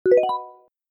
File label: chord middle